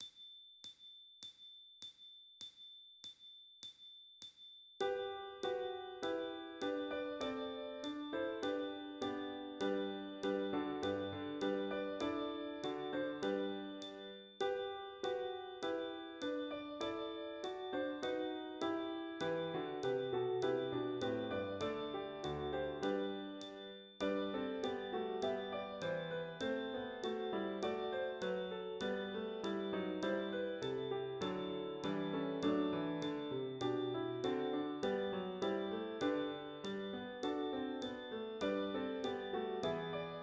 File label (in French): les anges dans nos campagnes avec pulsations rythmiques